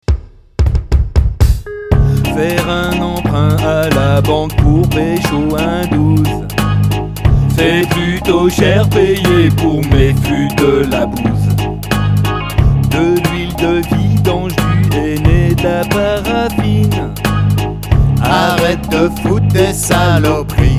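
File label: Reggae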